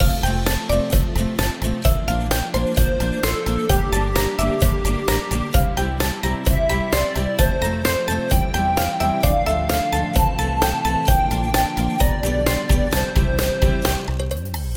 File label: Childish Theme Loop